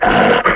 Lycan wolf preattack

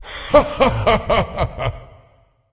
laugh; Elemental Janus; Smite

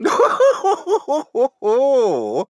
laugh; Paladins; Torvald

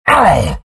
pain; beastmaster

